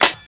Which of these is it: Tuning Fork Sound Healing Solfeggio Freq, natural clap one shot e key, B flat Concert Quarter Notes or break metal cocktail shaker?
natural clap one shot e key